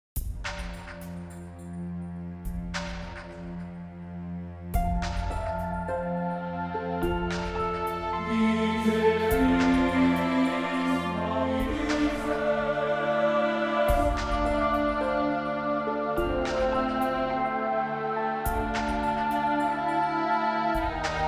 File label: Loop